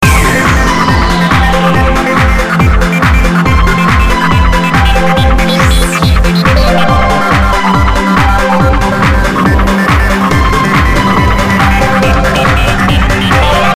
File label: loop